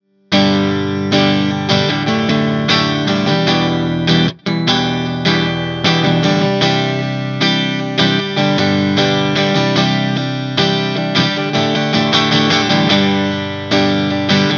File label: JTM CLEAN Orange V30